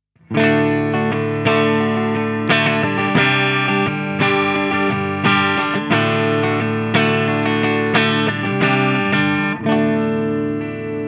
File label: Jackson Soloist 8 Clean